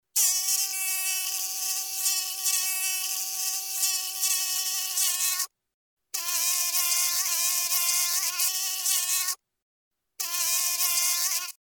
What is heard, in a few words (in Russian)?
Moskit